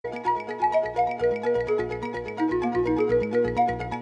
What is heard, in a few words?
Sms Dream